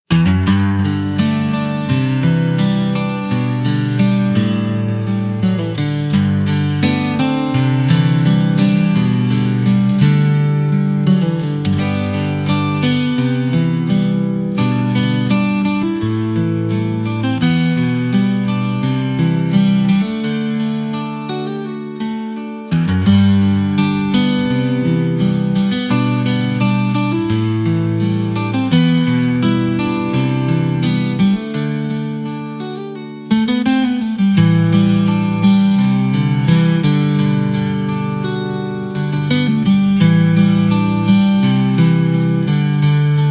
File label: Gentle Waves, Gentle Guitar Journeys